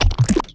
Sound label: Snot Plop; worms2